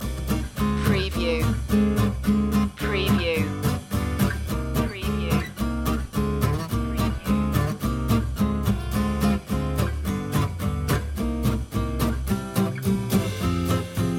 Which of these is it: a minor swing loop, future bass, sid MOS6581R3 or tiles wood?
a minor swing loop